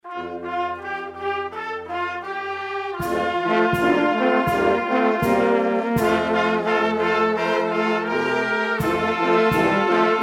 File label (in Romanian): Fanfara